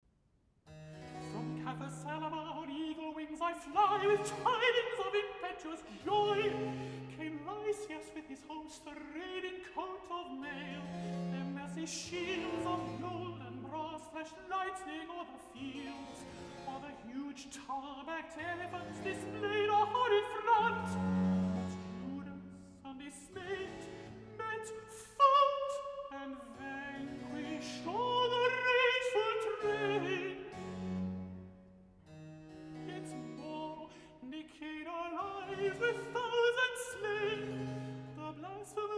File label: Recitative (Messenger countertenor); George Frederick Handel; Judas Maccabaeus 1746; Act 3; From Capharsalama on eagle wings I fly